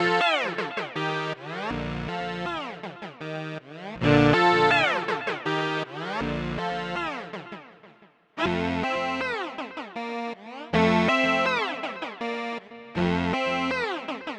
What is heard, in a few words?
Boss SY 1000